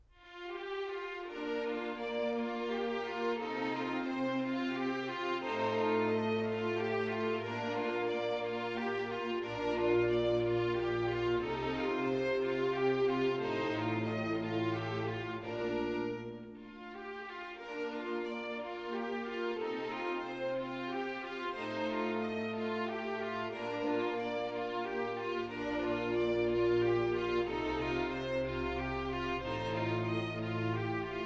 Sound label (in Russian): Соната для фортепиано си-бемоль мажор